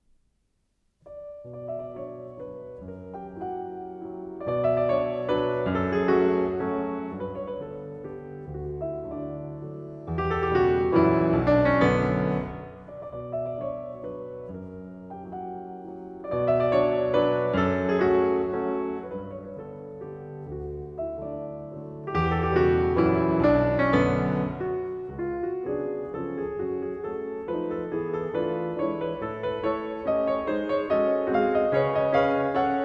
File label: chopin mazurka no 19 in b minor op 30 no 2 allegretto